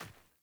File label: Sandals Run Dirt, Footsteps; Characters; Player